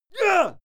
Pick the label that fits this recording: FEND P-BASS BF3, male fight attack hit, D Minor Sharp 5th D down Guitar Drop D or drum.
male fight attack hit